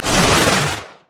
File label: surging tides; nami base